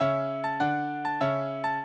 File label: minuet, mozart